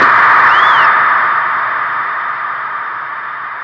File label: Base hit noise, baseball pinball arcade game